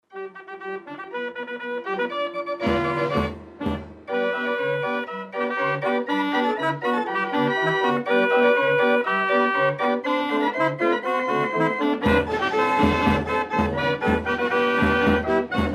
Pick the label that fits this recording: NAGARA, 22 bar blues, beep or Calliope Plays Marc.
Calliope Plays Marc